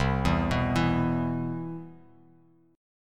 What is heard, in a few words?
C Major 7th, Bass Standard; CM7 arpeggiated; JGuitar